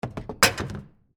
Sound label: Ski Ball Hole, Arcade, In; Technology; Arcades; Games